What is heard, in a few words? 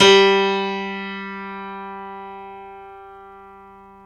PNO, Grand Pno; Club-50 - Foundations Roland; YPno 1 M